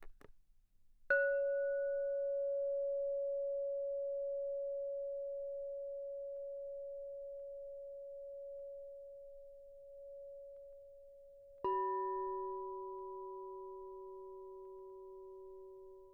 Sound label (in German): Kristallklangschalen